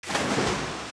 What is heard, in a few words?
Land; Octorok; OOT